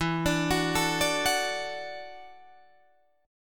E 7th Suspended 2nd Suspended 4th E down Guitar Drop D